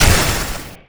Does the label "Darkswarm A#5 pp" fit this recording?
No